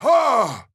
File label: Attack Large